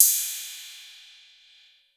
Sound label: 808, CYMBAL; CYMBAL P2